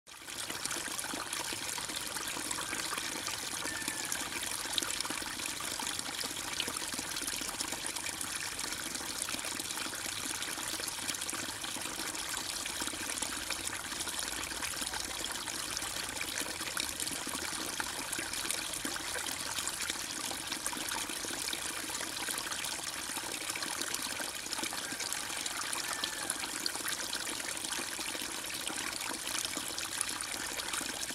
terapi air mengalir

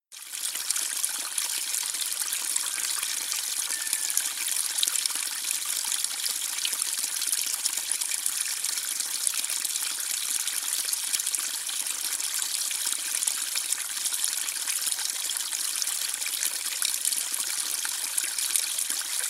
terapi air mengalir

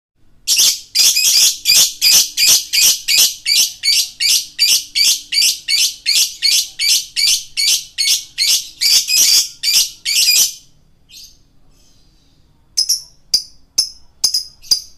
suara lovebird jantan gacor

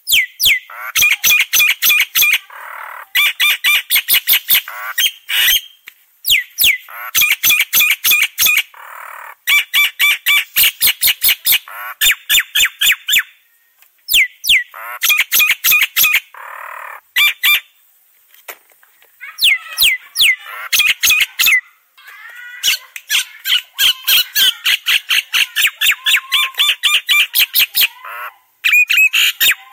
Suara Burung Jalak nias full isian

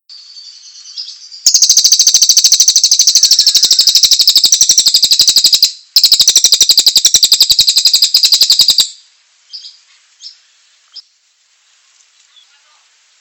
suara cililin

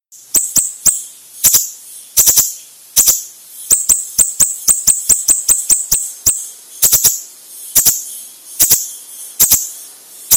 cucak rawis gacor

